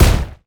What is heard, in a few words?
Impact